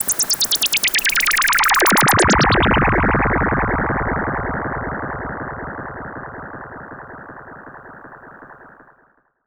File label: SH-09 Delay